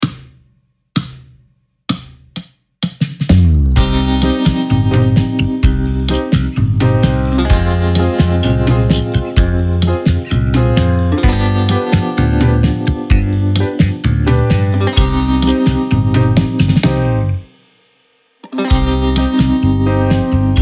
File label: Tango